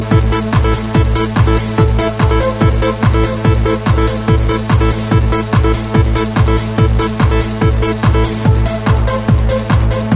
ticking synths

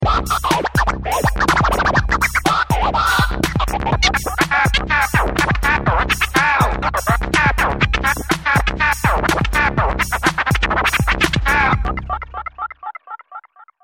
bass bins scratch

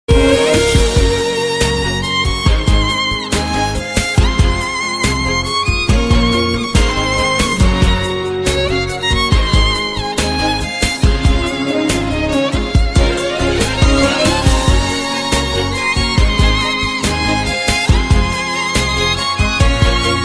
romantic music

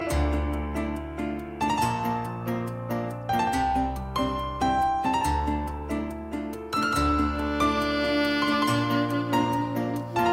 beautiful piano

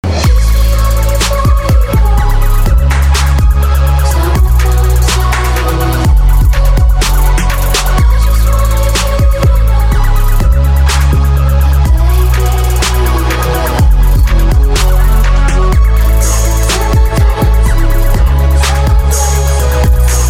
trap remix

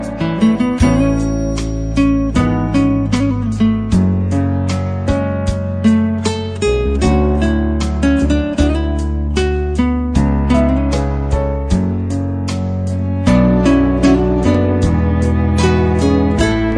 guitarinho

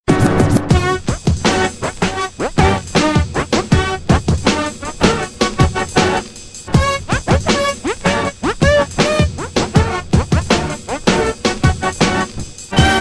scratch; star wars

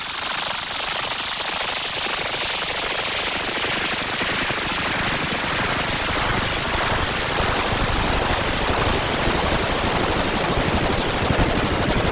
futuristic

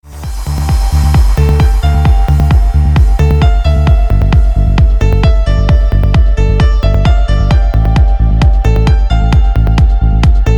Trance

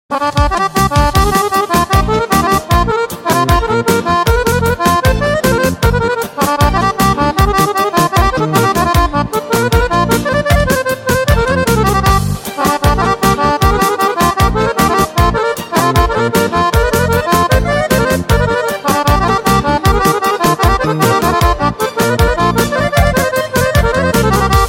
romanian music

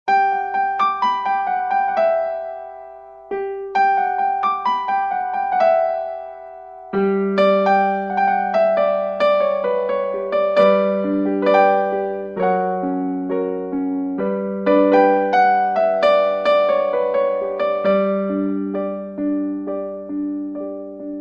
slow n soft piano

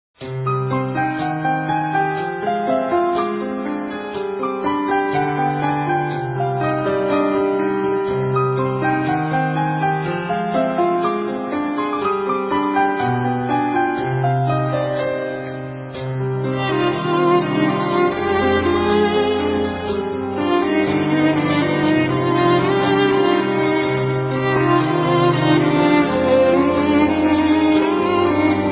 piano

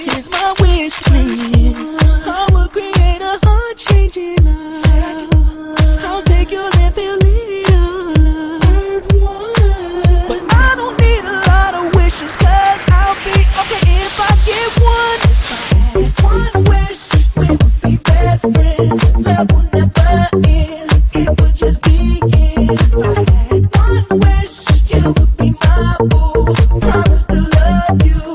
dirty rnb

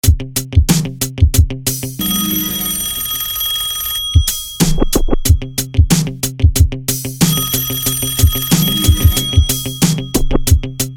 classic ring rap mix

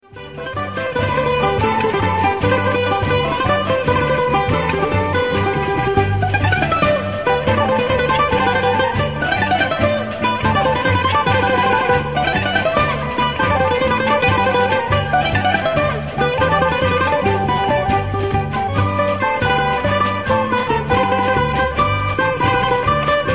greek folk music

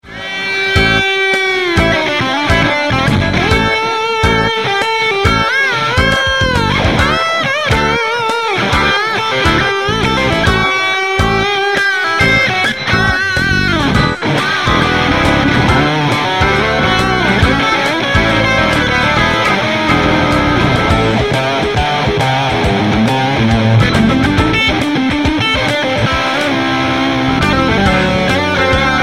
electric blues guitar improvisation

